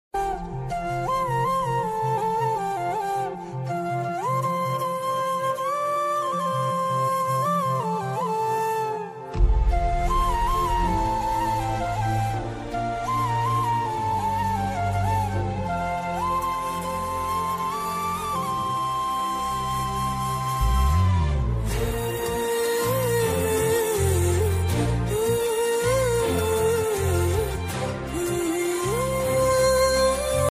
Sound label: love bgm; brother and sister love